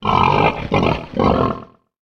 Grunt; Eldin; Lord Bullbo

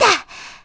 Land; Medli landing; zelda sounds

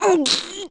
Push; Link pushing a large object; Zelda

